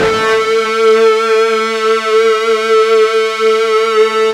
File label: FUZZ A 2, ULTRA FUZZ, Guitar; East West; Steve Stevens Guitar Samples Collection